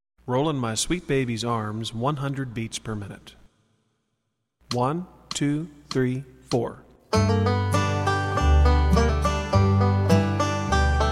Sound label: 100bpm, banjo; Roll In My Sweet Babys Arms